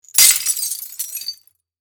Multiple glass shards drop with debris

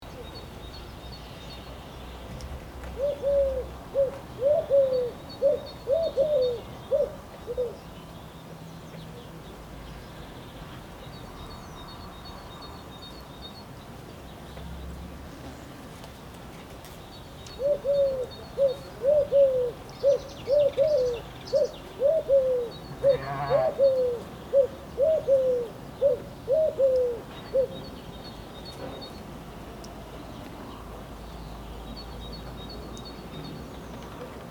Morning farm ambience birds singing

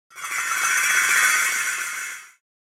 Ceng ceng rolls

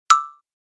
Bright pop up

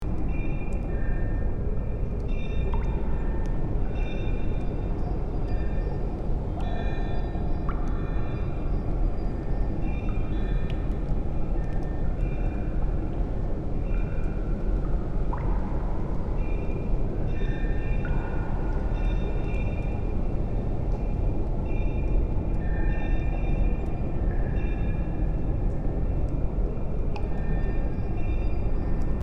Crystal planet cave ambience

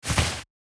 Handling jacket noise clothes

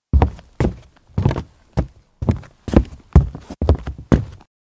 Footsteps walking on wood in casual shoes

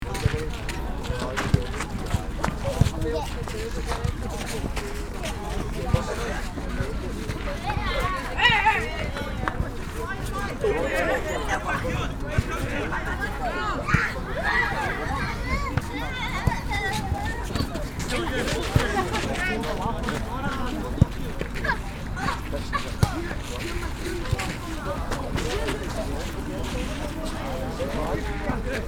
Playground sports field kids playing soccer loop

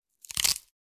Light bone crack

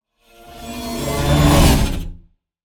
Metal wires transition

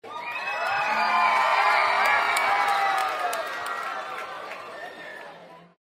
Confused crowd panic and distress; Audience and Crowd Sound Effects

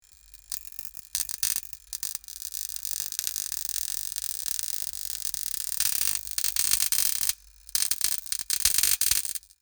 Broken lamp electrical buzz light humming; sound design effects

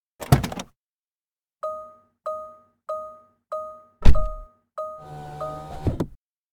Car interior foley door beep signal window

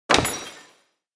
Casino slot machine prize symbol

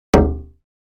Empty plastic container impact single hit

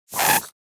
Empty gel bottle squeeze

